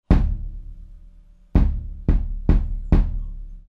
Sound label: pearl forum bass drum 22